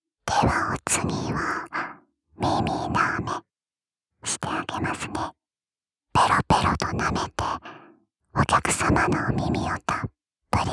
耳舐め, ASMR

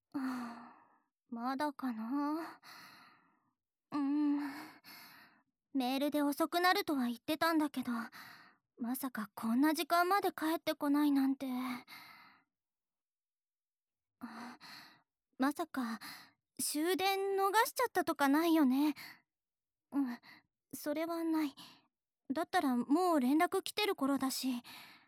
お風呂で手コキ; 身体の隅々まで、いーっぱいゴシゴシしてあげるね……。

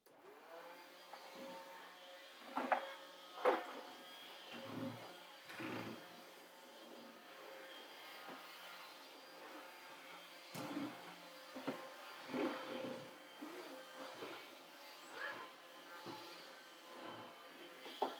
掃除機をかける

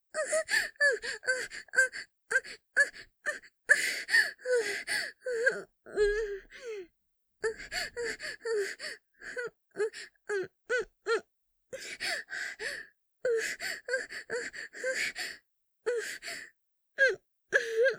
ピストン喘ぎ; 淫語実況

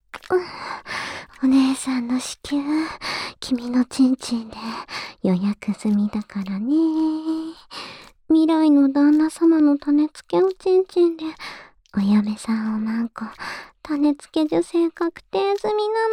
受精おねだり耳舐め♪; ドスケベおねーさんがボク専用の種付中出し肉便器オナホに立候補してくれた件♪

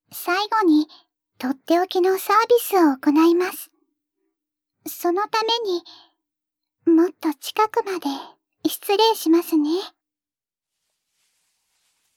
リラックス耳舐め, ASMR

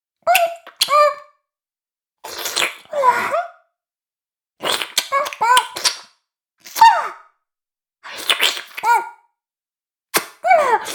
フェラ音声; おにいちゃんアイスぅー！; 妹

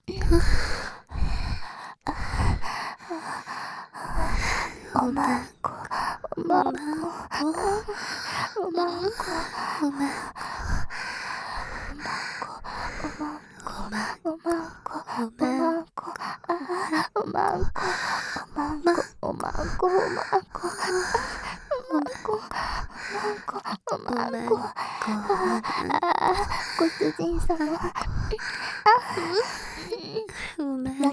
喘ぎ; お屋敷メイドのハーレム淫語ヘヴン 気が済むまで耳舐め淫語を堪能できるバイノーラルオナサポ音声

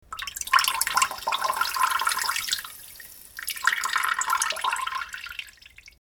ちょぼぼ しょ ちょぼぼおしっこ

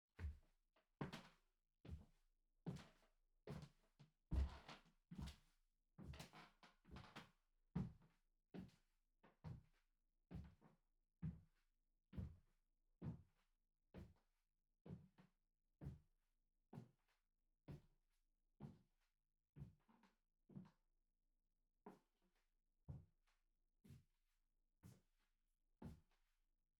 行ったり来たり 低速, 足音, スリッパ; KU100

